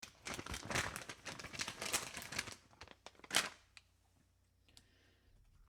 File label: bacon out of package, household